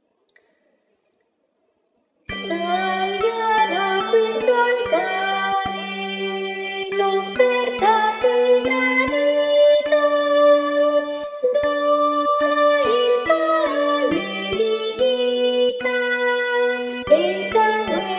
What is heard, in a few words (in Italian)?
salga da questo altare, soprani